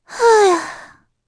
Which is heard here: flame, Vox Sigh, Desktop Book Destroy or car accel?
Vox Sigh